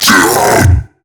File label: Hand Bomber Pain; MiTW; Battle Barks